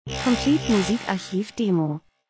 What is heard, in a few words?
transition; Transition & Movement - dunkel Break monoton